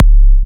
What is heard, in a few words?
Trance Kicks